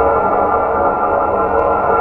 portal loop; savant

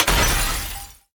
tap; mewtwo

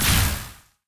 tower arcane Wizard basic Attack

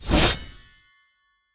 fairy fury hit; catha

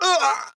enemy cultist acolyte death; sfx

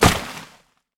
cut trees

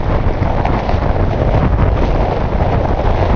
worm dirt Loop

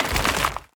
root Defender low; heroes nyru

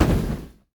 tower necromancer basic Attack hit

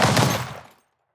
storm fade out; heroes; anya; argent

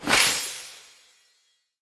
white tree tap; bittering rancor

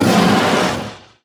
enemy beast aura; wukong gebeast

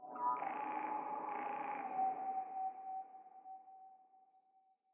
ambient wind

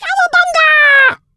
Hacksaw

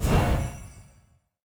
heroes lumenir light companion basic attack

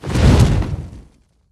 phoenix egg explosion

